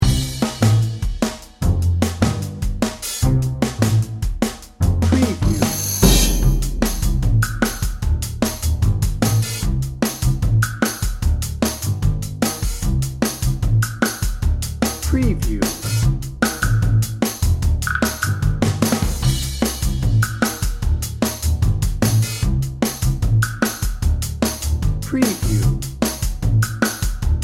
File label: 50s game show dnb